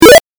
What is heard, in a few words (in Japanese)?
8bit 選択